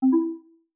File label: join